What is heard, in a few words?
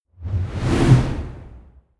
whoosh air